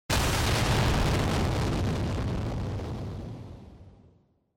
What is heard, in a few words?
Explosion Long